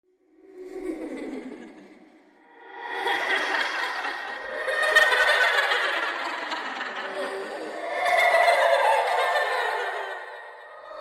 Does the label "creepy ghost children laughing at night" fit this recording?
Yes